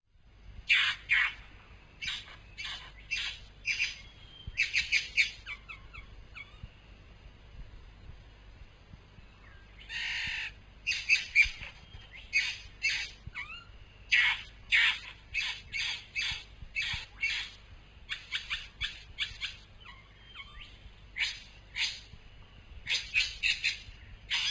bowerbird satin, bird calls

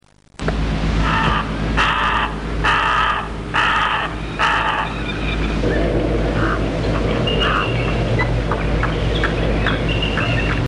heron white-faced, bird calls

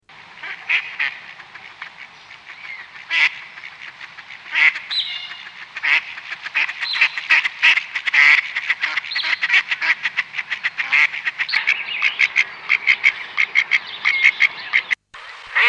duck pacific-black, bird calls

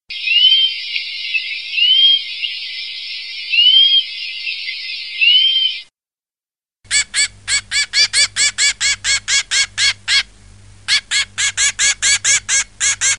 firetail diamond, bird calls

